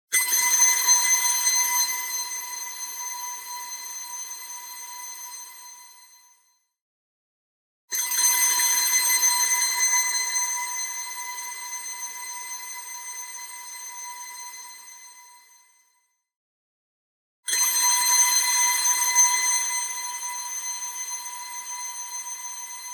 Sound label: Granular Shing Constant; BLING; boom library; Anime Essentials